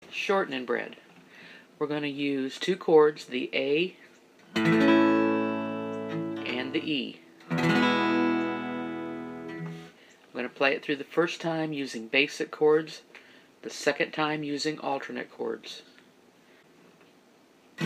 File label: Guitar Chords Key of A; Shortnin Bread